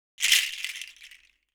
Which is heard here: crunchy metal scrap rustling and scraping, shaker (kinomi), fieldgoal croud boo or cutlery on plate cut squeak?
shaker (kinomi)